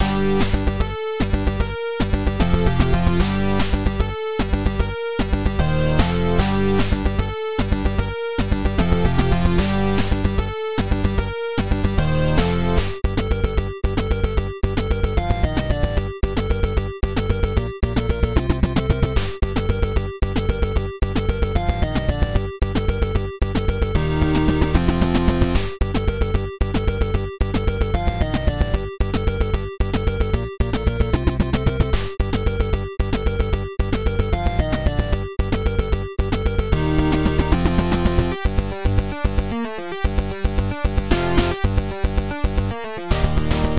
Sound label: boss battle alternate, 8-bit; Just an alternate, old version I found from the archives. Loopable.